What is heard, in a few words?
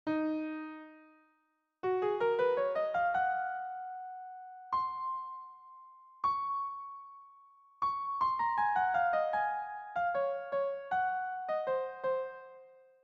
rythm